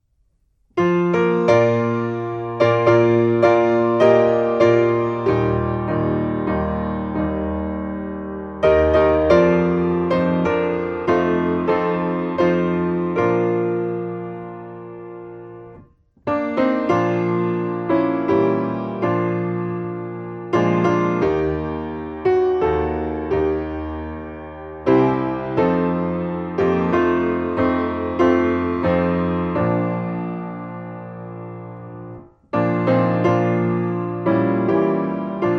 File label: piano; Hymn; The Old Rugged Cross (vs 1); Bethel's sanctuary